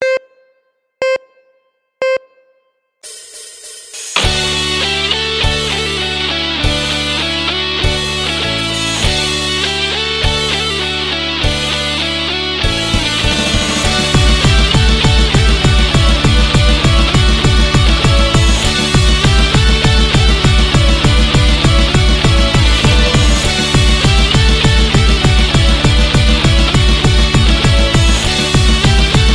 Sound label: Sinfonie Nr9